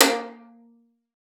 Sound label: VIOLIN PIZZ A#3; Xsample VOL-5; 90 s Sample CDs